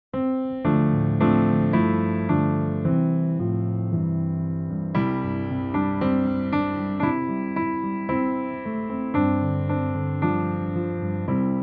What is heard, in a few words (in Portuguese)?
(Backing), playbacks musicas criancas; iniciantes